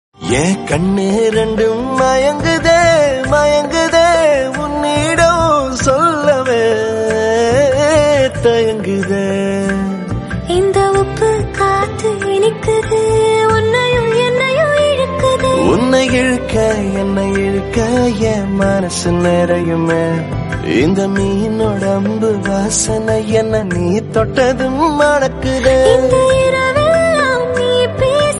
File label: tamil song; maryan